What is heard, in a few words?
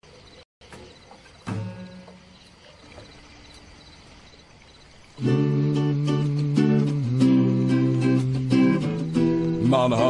Gitar